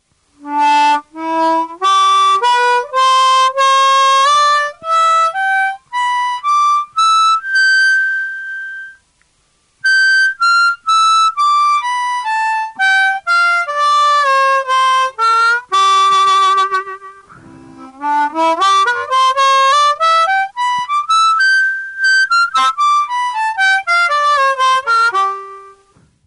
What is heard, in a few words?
blues lick, harmonica